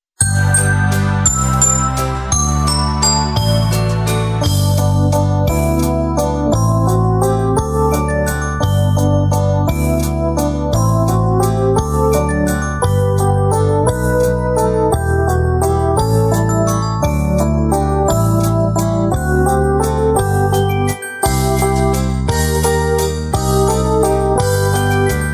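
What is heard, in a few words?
backing track with melody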